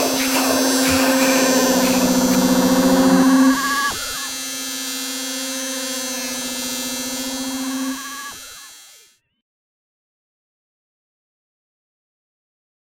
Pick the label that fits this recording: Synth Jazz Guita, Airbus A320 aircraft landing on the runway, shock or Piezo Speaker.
shock